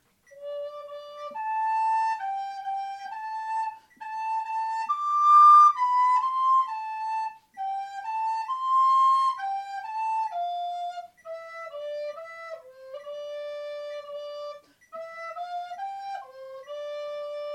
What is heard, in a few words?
flute